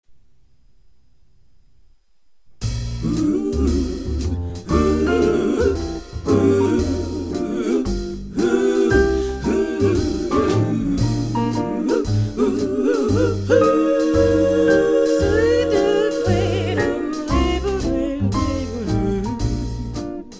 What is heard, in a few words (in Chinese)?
JAZZ版; sakesi; banzou